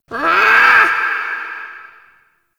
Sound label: Ambient Meow Far; Characters; Warframe; Kalymos